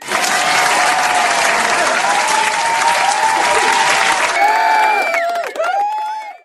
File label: cheeering